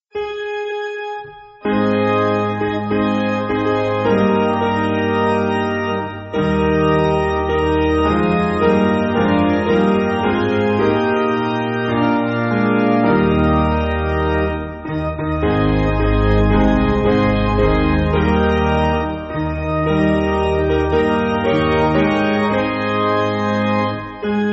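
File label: Piano, Music Backing; Glory Be To The Father; Mieneke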